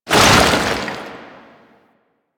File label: Chain Reaction Cast; Warframe; Warframes; Qorvex